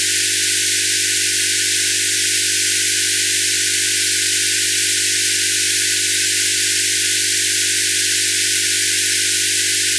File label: noisy msg